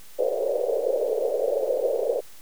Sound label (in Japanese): 音色的高さ fc=500Hz; 音の高さ